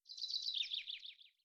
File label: chirp